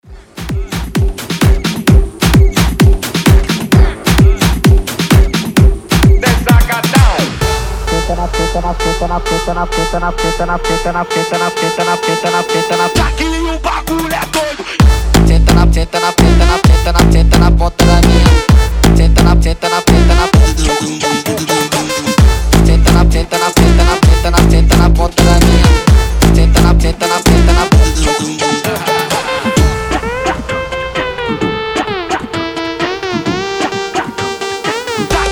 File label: baile funk breaker 130 bpm